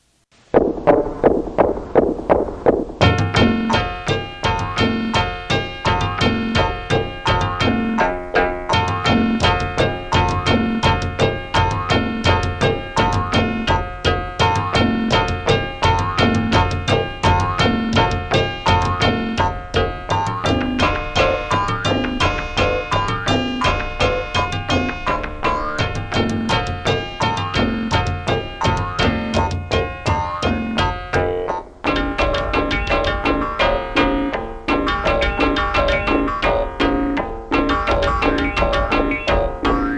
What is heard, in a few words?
Song NO Vocals; Puppet Arts Theatre; Momotaro; Oni Demons Song